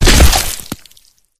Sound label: bodyfall combat form; flood